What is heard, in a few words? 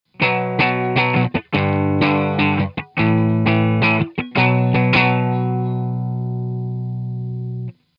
clean